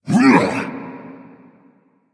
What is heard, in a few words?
grunt attack; smite; thanatos